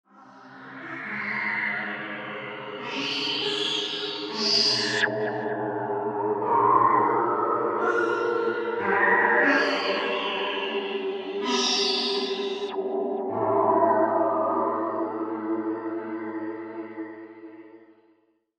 Sitars In Space; sci fi music; science fiction sound effect; star wars / trek style games; Andrew Fleming

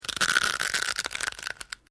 Dry Brittle Snapping Long; Various Organic Foley sounds; Human

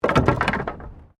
Wood Fall

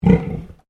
Animal Pig Landrace Inside Grunt

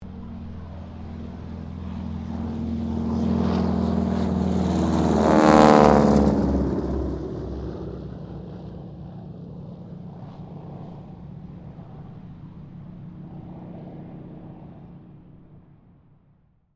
AIRCRAFT HARVARD SQUADRON PAIR TAKEOFF; Mixed aircraft squadrons; Airplane Sound Effects; Airplanes (Sound Effects:Vehicles)

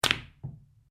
Billiards Cue Ball Cushion; Home billiards table; Pool (Sound Effects:Sports)

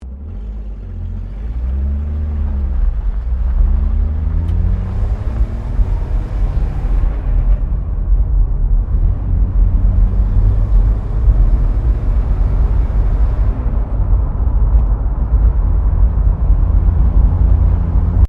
CAR HOLDEN FE 1957 INTERIOR ACCELARATING; Classic Australian cars; Vehicles

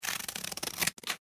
Office Scissors Cut Paper

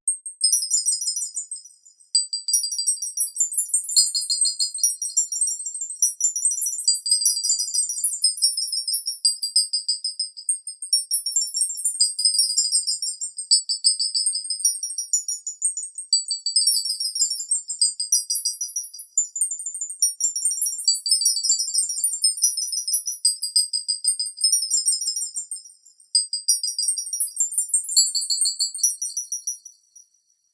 Icicles, Tinkling icicle chimes.; Multimedia

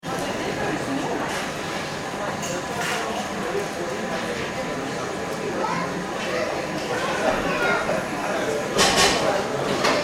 ambience airport terminal, Small Terminal; Airport Ambience - Small Terminal Building With Check - In And Cafe Close - By - La Rochel; Airports; Backgrounds; la rochelle; McKinney Sound

